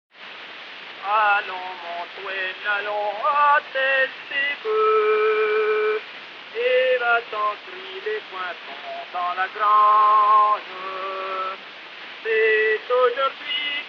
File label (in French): chanson, chant; Allons mon Toene allons; 20 ans cultivateur